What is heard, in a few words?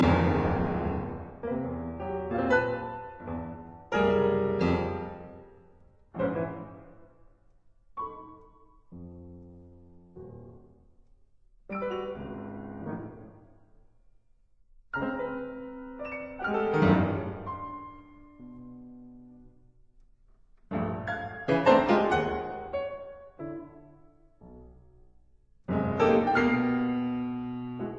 Piano Sonata; Tropes